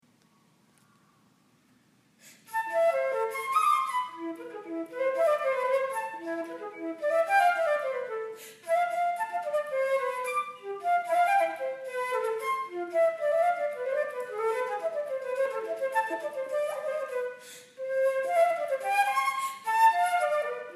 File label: Flute; allstate